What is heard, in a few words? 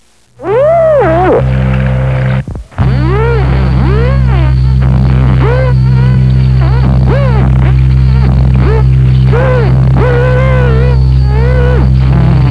Porpoise, Ocean Noise